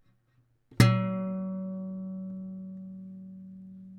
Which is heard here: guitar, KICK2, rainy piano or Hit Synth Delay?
guitar